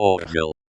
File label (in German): orgel